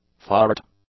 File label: fart